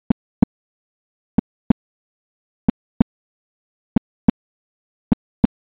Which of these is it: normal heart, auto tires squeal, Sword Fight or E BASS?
normal heart